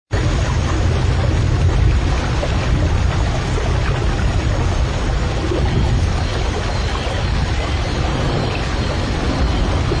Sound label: Rainforest